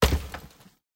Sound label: Wall Break